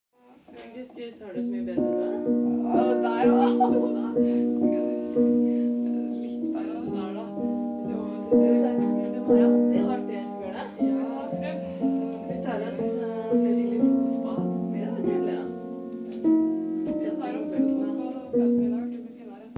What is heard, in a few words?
Piano sounds